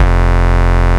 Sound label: Root F, Bass